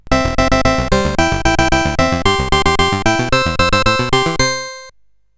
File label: sid CSG8580R5, GAMES; Fast Tracks